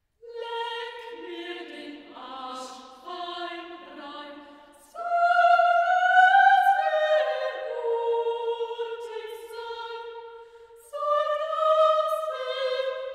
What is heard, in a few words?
B Flat Major